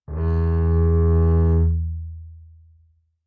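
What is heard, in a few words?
Bass arco fortissimo sul E Gb2, Double Bass; MIS Pitches - 2014; Strings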